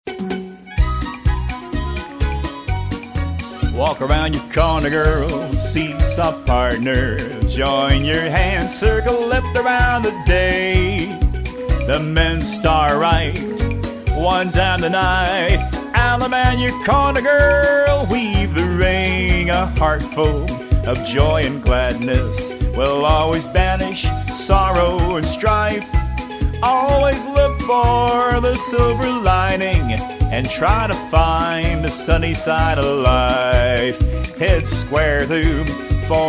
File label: vocal